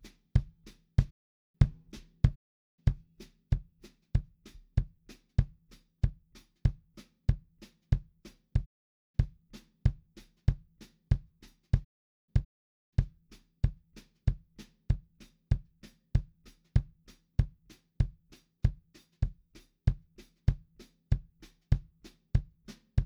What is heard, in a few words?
Drum Bass Kick